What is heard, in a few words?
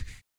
ELASTC TUBA, Vocal Blues Soul; Spectrasonics; Planet